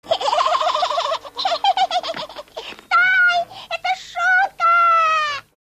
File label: Zipper